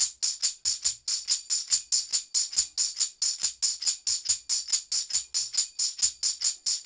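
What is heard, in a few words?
TAMB, tambourine; Drumdrops In Dub VOL-1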